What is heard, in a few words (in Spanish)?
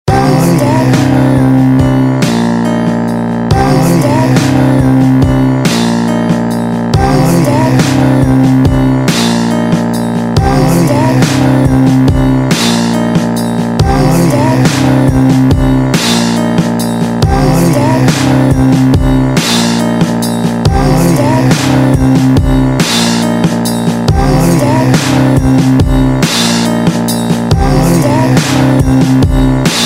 LOOP LENTO